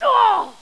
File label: die; Caesar III